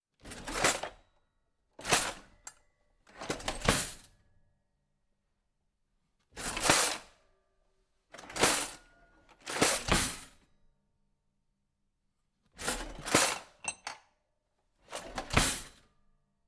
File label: kitchen drawer